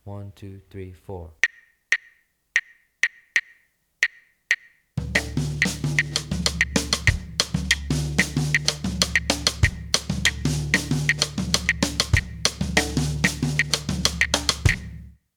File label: basic guaguanco